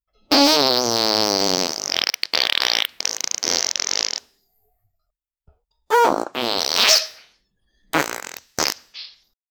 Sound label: infinite screaming farts